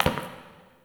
Idle Single Step; Ambience; Tagfer; Warframe; Entrati

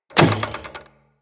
Bow Shot Twang; Weapons; Player; DendritBow

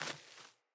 Sandals Run Vegetation, Footsteps; Player

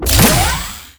Shock Traps Embed; Warframe; Captain Vor; Abilities Redux

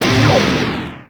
Proj Large Fire; Warframe; Lizzie

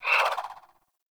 Swing, Weapons Melee; Warframe; Modular Melee

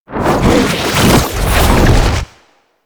Ultimate Focus Operator Cast; Zenurik; Ultimate Abilities

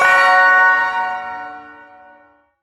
Ersatz Shawzin Yo Chord I; Warframe; Duviri

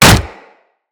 Kick Hit, Melee Impacts; Weapons

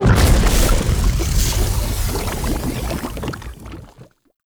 Lava Burst; Ambience; Props; Warframe; Tau; Circles Of Hell

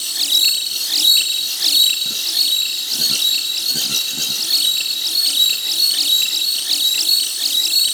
Ambient Tech Drone; Warframe; Tau; Ambience

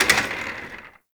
Office Chair Impact; Ambience; Gameplay; Warframe; Nineteen Ninety Nine

